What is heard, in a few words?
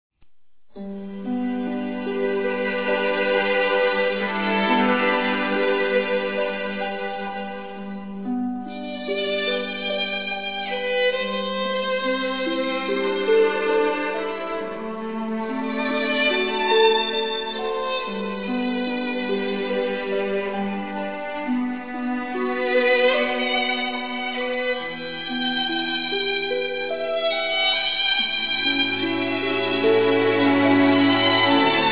violin; Saint seiya